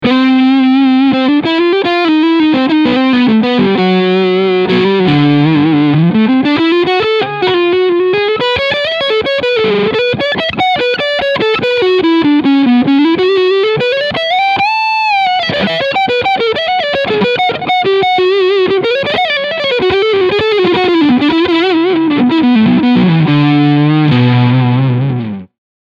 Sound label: Kramer Guitars Focus VT211S Leadsound Front Pickup